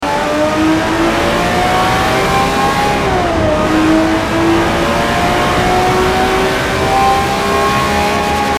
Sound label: second, vehicles; reventon; TDMCarpack; TDMCars